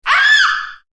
scream real

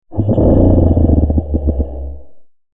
growl evil real

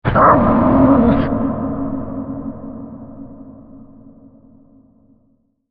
growl dog real; Halloween

